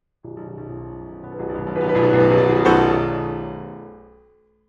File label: PIANO